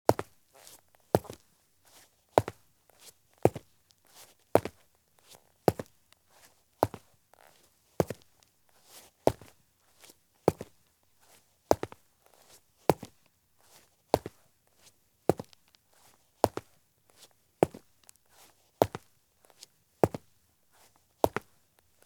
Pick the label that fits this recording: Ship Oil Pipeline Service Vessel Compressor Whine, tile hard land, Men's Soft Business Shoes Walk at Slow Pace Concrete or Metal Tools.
Men's Soft Business Shoes Walk at Slow Pace Concrete